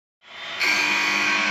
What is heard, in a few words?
buzz; Royal rumble